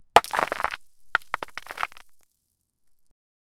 sandy rocks fall down

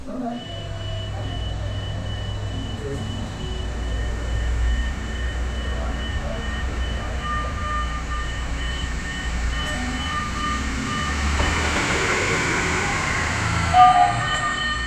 car dealership sounds, ambiance

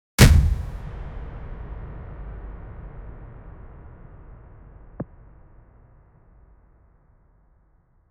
punch cinematic

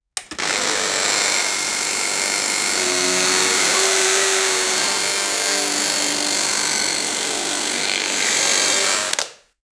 boltcutter, metal

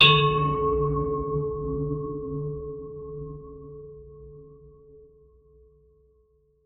deep bell strike

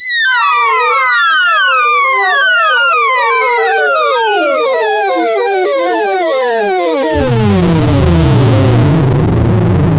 modern ai generating, artificial intelligence

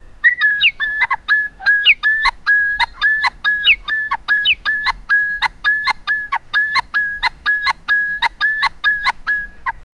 happy animal sounds birds

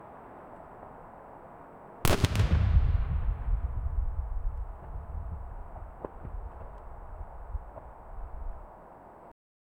distant and near sounds a

